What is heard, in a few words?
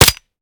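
weapon fire last player mech, 1911; weapons; gmod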